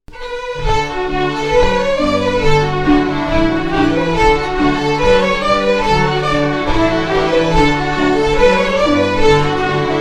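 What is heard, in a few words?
Strathspey as REEL, Scottish Fiddling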